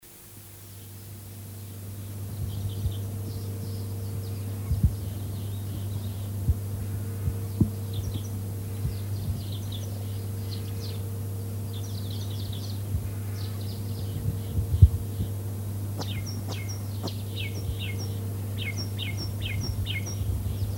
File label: large tree finch